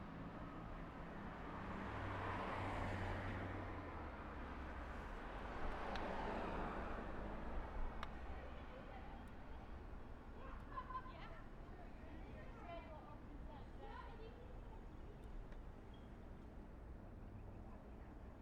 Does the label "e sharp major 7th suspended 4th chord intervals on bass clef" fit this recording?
No